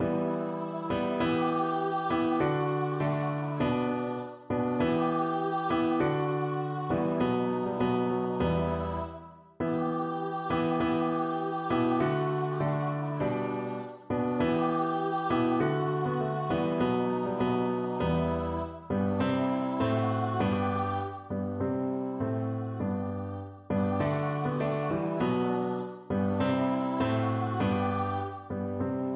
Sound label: VOICE, unison choir